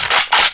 flak cannon ammo pickup

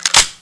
tar21 bolt pull, weapons

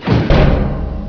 loader step, ambience

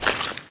spore ammo, weapons

